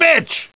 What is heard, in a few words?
bust crate; svencoop; cracklife